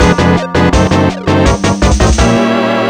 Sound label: Boss Music Menu; Spookware